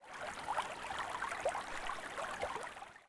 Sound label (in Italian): Fiume; BLIND QUEST - The Enchanted Castle